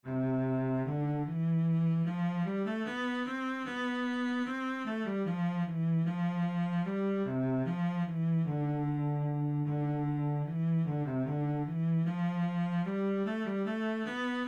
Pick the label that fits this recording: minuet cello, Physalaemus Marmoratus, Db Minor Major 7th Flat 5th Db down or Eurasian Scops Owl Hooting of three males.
minuet cello